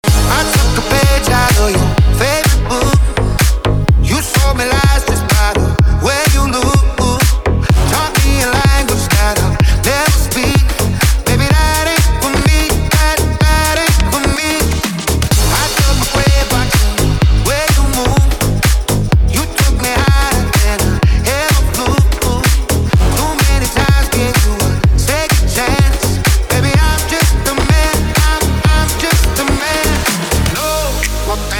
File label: Club Party Banger